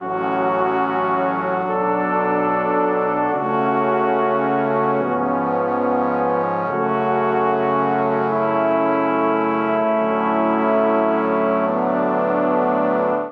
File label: Progression F# Minor 72 bpm, Brass Quartet; No Rest For The Wicked; Ableton; Brass Quartet by Spitfire Audio